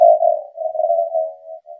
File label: boomerang resonator; Various Strange Sounds and Effects